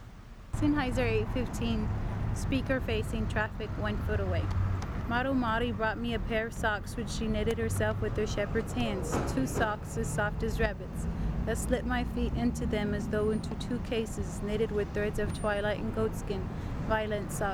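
Senn 815 1ft Speaker Facing Traffic, Super Cardiod, Exterior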